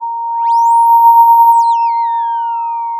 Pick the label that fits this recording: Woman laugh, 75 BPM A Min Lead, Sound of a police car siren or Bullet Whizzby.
Sound of a police car siren